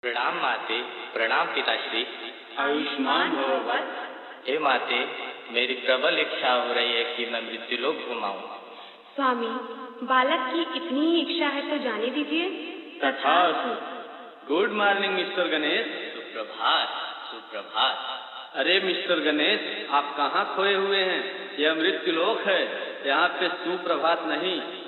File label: Old Is Gold BolBam Song